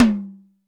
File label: JAZZ T, JAZZ KITS; Crypton; DrumBase5000